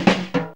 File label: FILL; Zero-G; Funky Elements